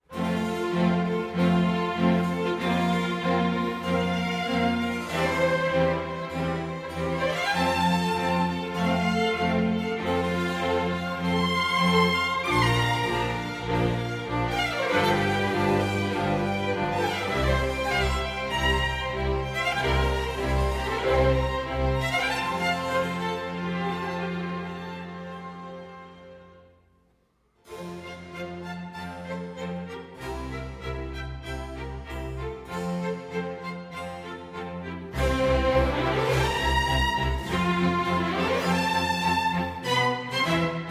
Bassoon Concerto in F Major, Allegro

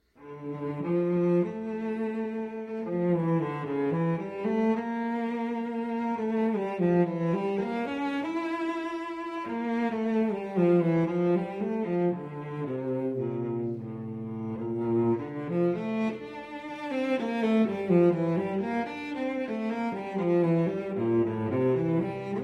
Cello Suite No. 2 in D Minor Prélude; BWV 1008

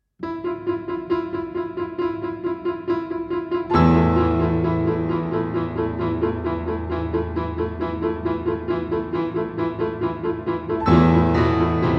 For Piano Duet; Orphée; Act 2

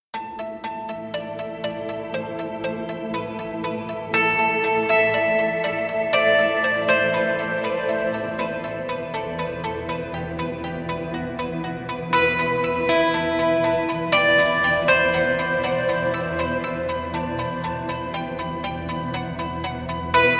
Spheric Guitar

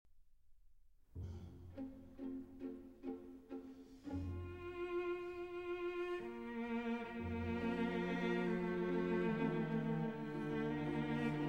Cello Concerto in A Minor; Op. 129; Schumann; II. Langsam

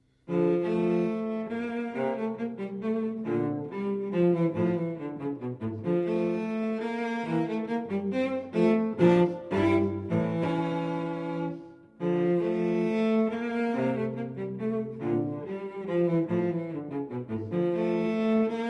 Cello Suite No. 2 in D Minor Menuets I & II; BWV 1008

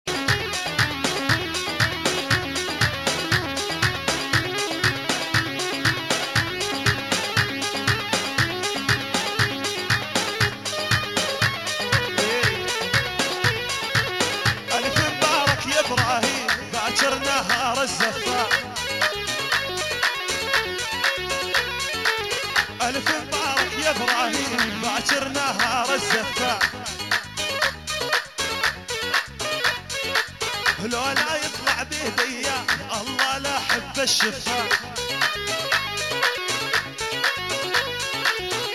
Live Arabic Dabke

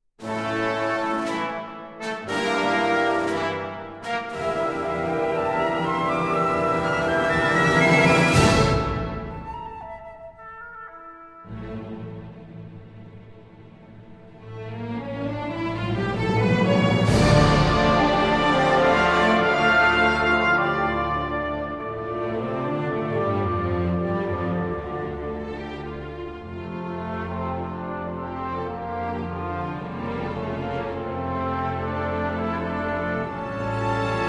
Symphony in D Minor, Tempo moderato; Symphony No. 4; Price

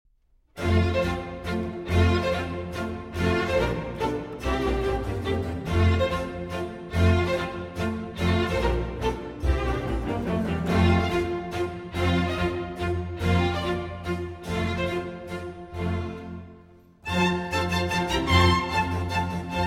Violin Concerto in F Major; Vivaldi; The Four Seasons; Autumn; III. Allegro

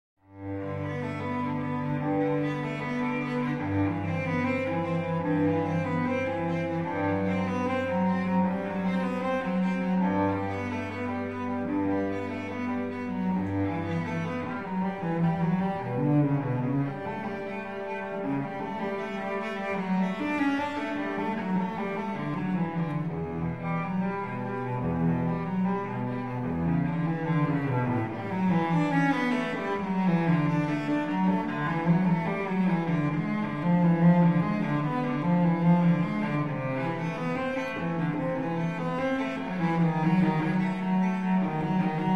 cello suite; Cello Suite No. 1 in G Major, BWV 1007; I. Prelude

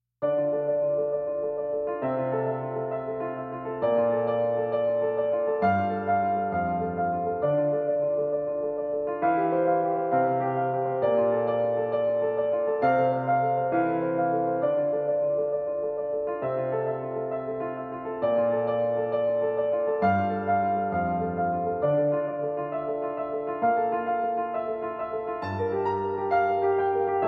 Piano Only

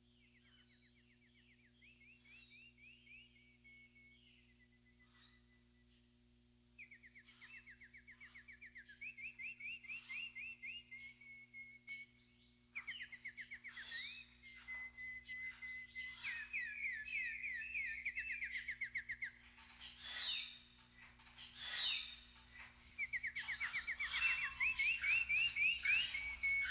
Cumbia & Jazz Fusion

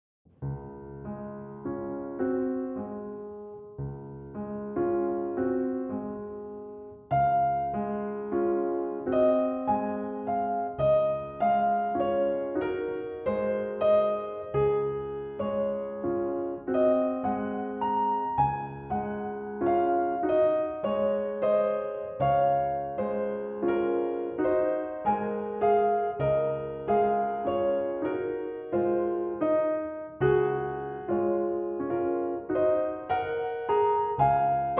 Berceuse, Op. 57, in D-Flat Major

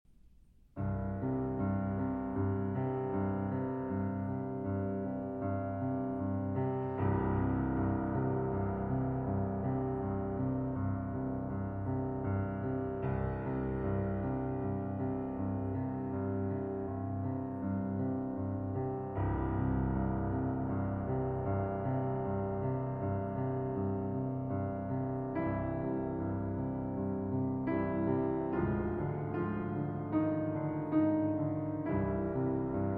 Piano Concerto No. 3 Movement III; For Arvo Pärt

